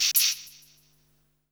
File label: FX, Hip Hop R n B; USB Soundscan vol.19; AKAI